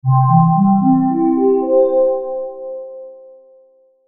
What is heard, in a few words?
Logo Soft Synth Ascend; Melody Chord; Blastwave; Sonic Logos